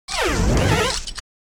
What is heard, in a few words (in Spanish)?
Grito de Gliscor